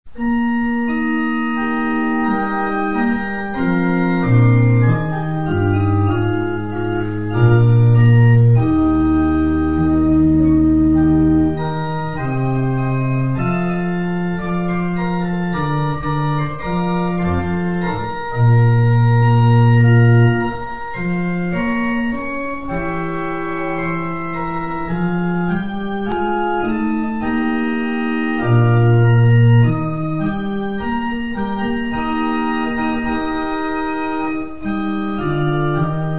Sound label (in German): orgel